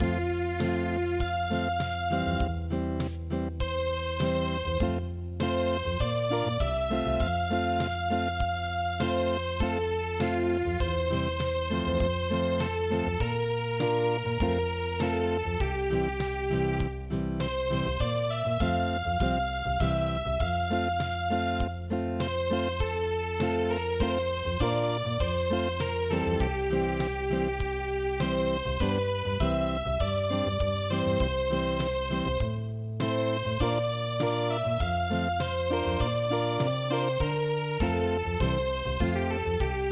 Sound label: Unplugged, midi; Hark hark the lark